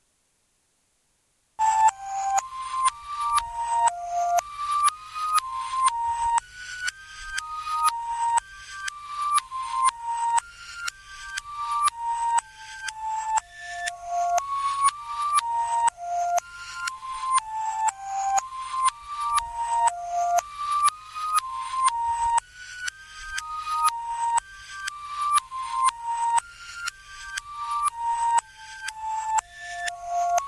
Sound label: Game over